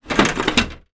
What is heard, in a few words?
household step ladder movement; zapsplat